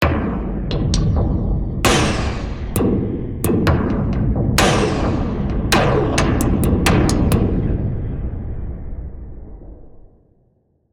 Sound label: STRANGE DRUMZ; eShop